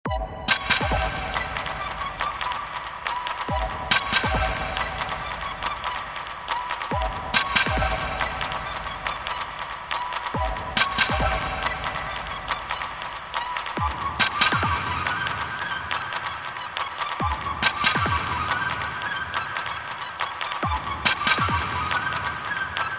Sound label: Zip; BiometallicFusion; Records and Tapes